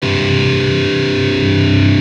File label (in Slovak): Powers akordy G c